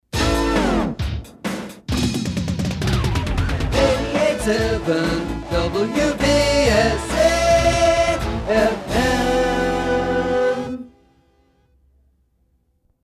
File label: JINGLE Cluster Fast Long